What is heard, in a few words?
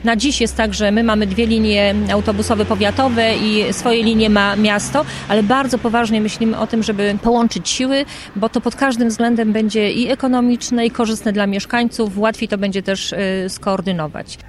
bandola